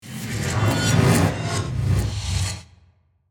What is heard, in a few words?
timetravel